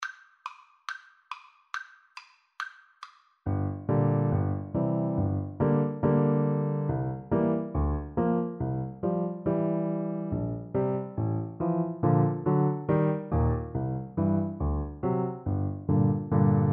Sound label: romanian folkdance, Trumpet; bartok